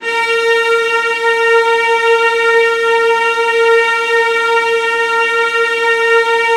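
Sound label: VIOLAS C5; ILIO; Synclavier Strings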